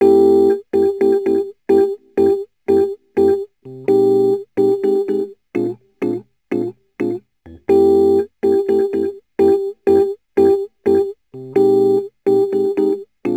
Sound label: Rhodes Attacks; Ueberschall